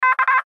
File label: Radio Morse Code; Morse Code Letter K; Morse Code (Sound Effects:Electronics); Blastwave; Warfare